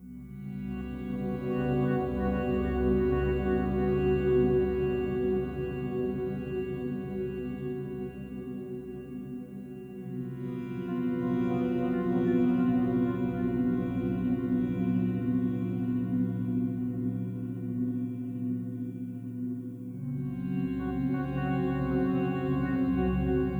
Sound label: Exploration; soma